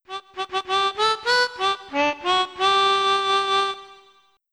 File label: Harmonica; Hey You, Pikachu!; N64